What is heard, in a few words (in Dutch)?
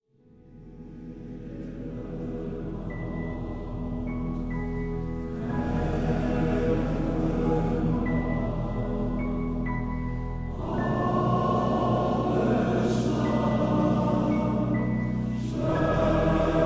stille nacht koor en publiek samen; F. Gruber